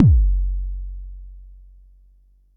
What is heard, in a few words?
Bassdrum, Jomox Xbase09